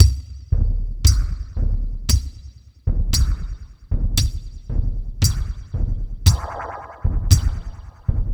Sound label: SECT FX, Industrial Loops